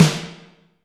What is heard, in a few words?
Snare, Alesis DM5 Drum Machines